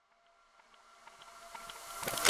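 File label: Camera Photo-Place Reverse; viewfinder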